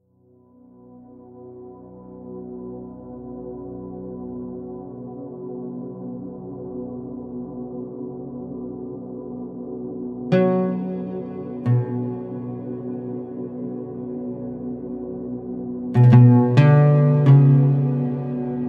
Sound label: Barbat in background; موسیقی بربط در بکگراند